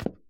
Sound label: stairs step; Golden Crayon Sounds